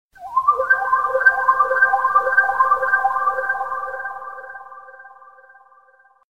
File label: Fantastic Sms Msg